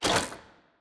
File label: Lock Switch; Puzzle